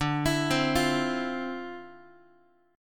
D-Minor 9th, D, Guitar, 4A, 4E, 5A, 5D, 5G sharp, 6C sharp; x,10,7,10,9,x; Dm9 arpeggiated